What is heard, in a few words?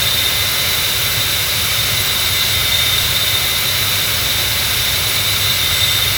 Aircrafts Engines Engine TF34 GE100 Core; DCS